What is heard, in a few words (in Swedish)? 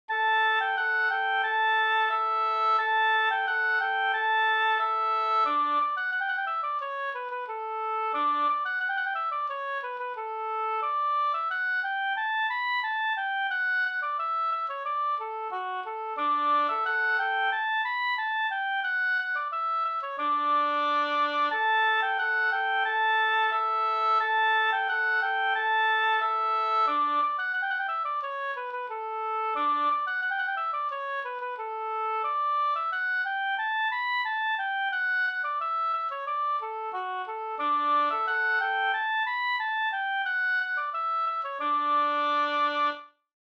skanklat